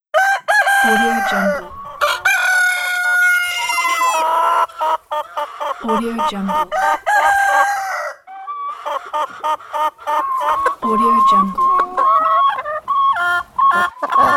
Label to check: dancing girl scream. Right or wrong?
Wrong